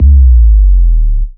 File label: Sub Hit, Bass; Sonic Foundry (Sony Creative Software); Underground UK House & Electro